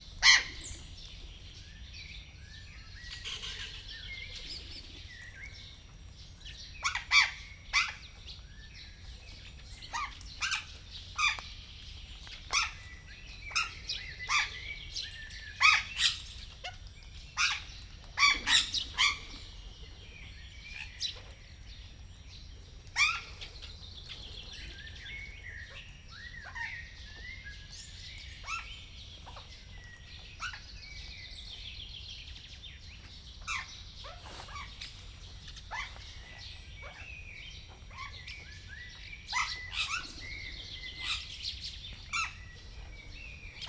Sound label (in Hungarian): kissé megilletődve, sziklapapagáj; miskolc zoo; miskolci zoo 2018